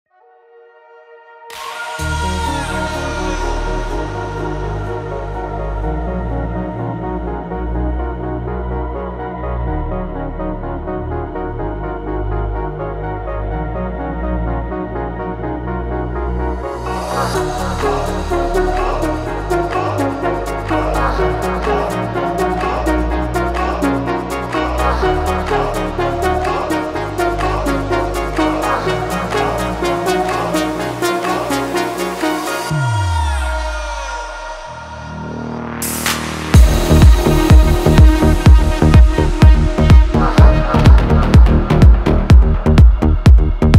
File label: remix melodic techno